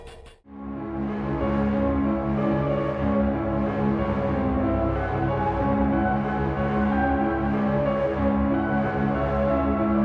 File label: melancholy, music